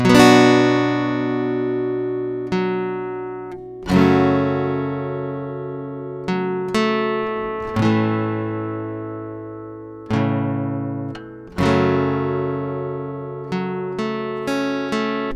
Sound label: Guitar - 125 BPM (F # Minor), Acoustic Guitar; Maria; Ghosthack - Free Guitar And Piano Samples